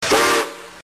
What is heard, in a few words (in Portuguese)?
Apito Curto, locos